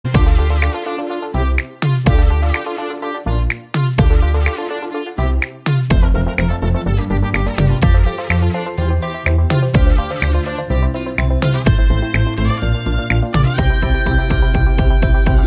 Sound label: Love Bgm; Majili